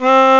harmnica; gmplay

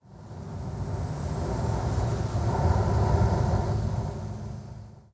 WIND, wind; zones

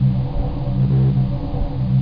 Metal Hum; Let's Discover Michigan!

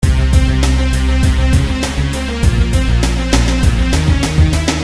loop; doodle

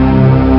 LW STRING; Groovy Bytes: Behind the Moon

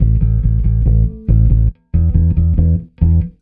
Reggae, Bass; ACID MUSIC

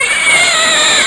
Scorpian Dies; Apeiron 1.0.2

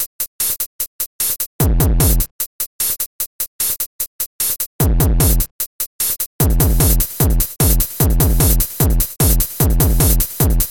techno groove; Octamed 6; members mods; late arrivals

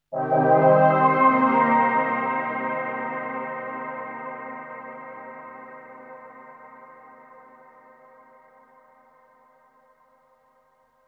synth pad ambient 160 C; Sphere

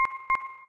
tick blue; Geschicklichkeit; Dino and Aliens

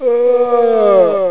groan echo; S.N.A.G. Disk of the Month 90-07; Southern Nevada Amiga Group

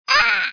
AAA hurt

